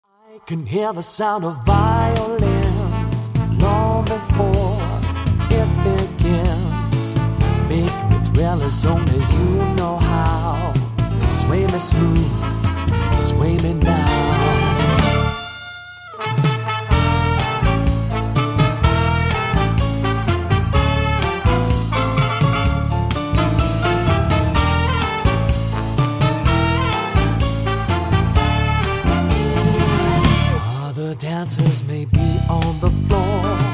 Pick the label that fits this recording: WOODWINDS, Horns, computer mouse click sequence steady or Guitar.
Horns